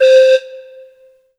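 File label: Yamaha TG100 Calliope Lead C5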